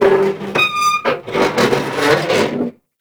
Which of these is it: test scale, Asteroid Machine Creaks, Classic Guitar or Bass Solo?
Asteroid Machine Creaks